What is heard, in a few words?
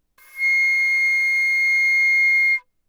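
Flute non vibrato mezzo forte C7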